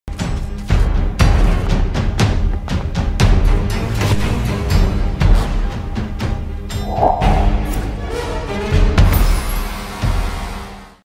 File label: Cinematic Music; Town of Salem 2; Executioner